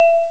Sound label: light bells; Amiga Soundtracker Sample Packs